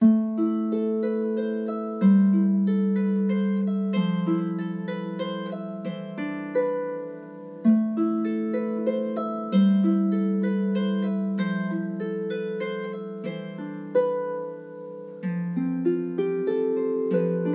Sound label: Harp